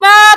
screaming; edd

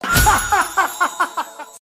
ha ha ha laughing; joker

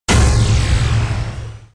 golden buzzer; agt

